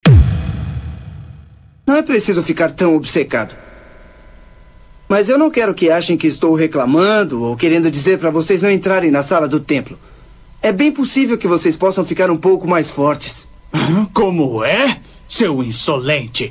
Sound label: pancadao; Power Sound Alinhamentos e Caixas